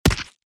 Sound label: BOW hits